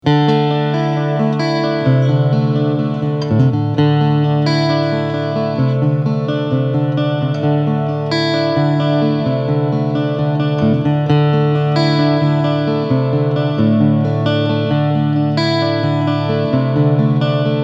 Fishman Loudbox Mini

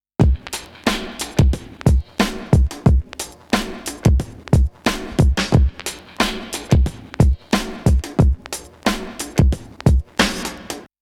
Loop stock tube

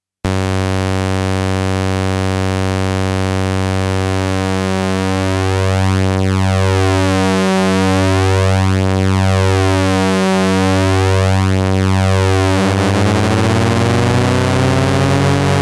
SAW

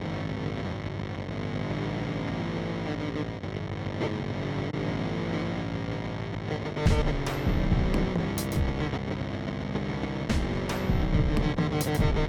Pigtronix Space Rip

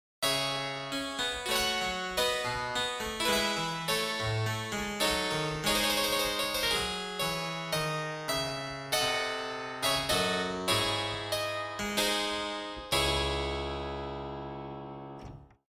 English Harp Nasal

